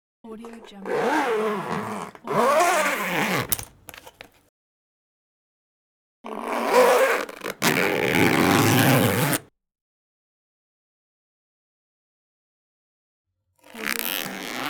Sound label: zipper sound pack